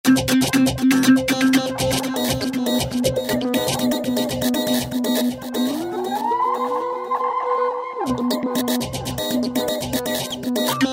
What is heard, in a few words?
Phrase Lab, Roland V-Synth